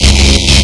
Phoenix Exp

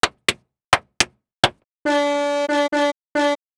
handclap

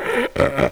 Ogre Attack

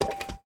umbrella walk

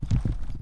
horse step

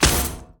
umbrella damage

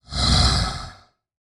medusa walk

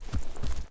step

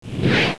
Blow

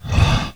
Dark Knight Die

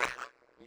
Goblin Attack

